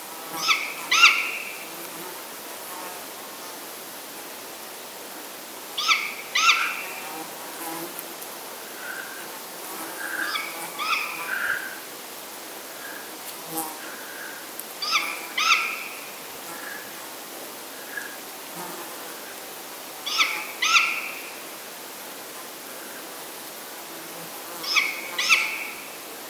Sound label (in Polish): puszczyk mszarny Strix nebulosa